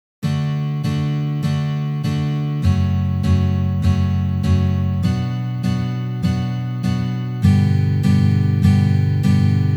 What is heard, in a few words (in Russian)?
Uprazhneniya dlya igry akkorda Re mazhor na gitare